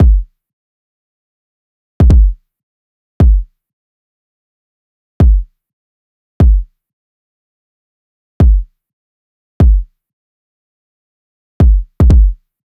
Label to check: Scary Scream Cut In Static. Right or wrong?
Wrong